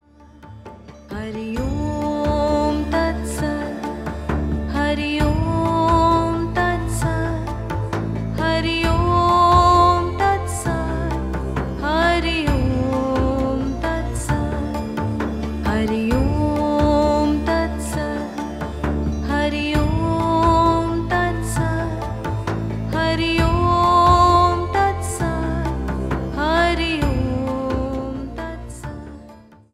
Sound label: mula mantra